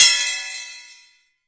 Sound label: SPOKE HH, SPOKE HI HAT